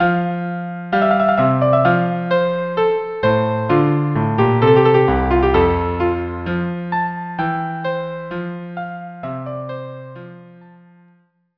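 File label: violin cello duo violin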